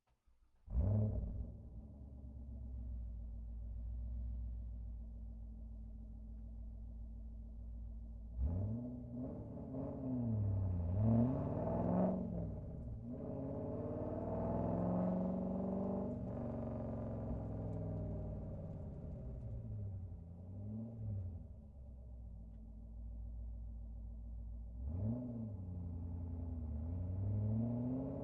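corvette c5 onboard start medium drive stop reverse stop drive stop off exhaust center; dpa4062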